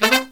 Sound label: Killer Brass Riffs